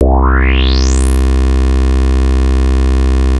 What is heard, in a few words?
Anasound Long mid C, Analog Single Notes